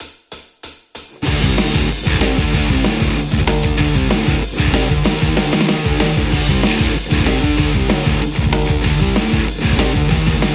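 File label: metal Stratocaster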